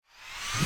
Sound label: whoosh; Heaven Studio Plus; Air Rally